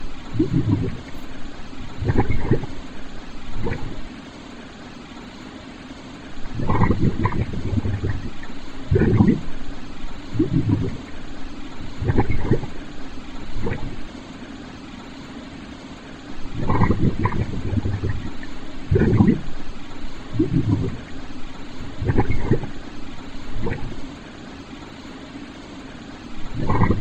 bubbling; Halloween

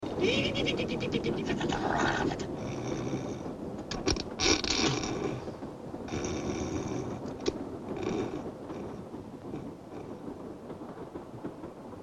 Lion Snoring Laughing

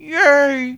yay

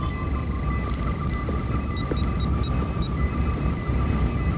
med back; Star Trek

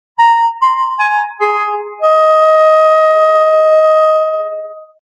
5 notes

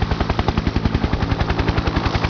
helicopt

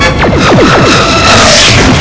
torp fire; Star Trek

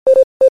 3 beeps; Star Trek; Asterisck

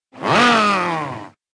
Frankenstein Monster Growl; Halloween

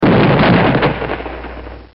explosion

